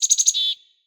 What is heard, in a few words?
Forest Open Glade Bird Close; Day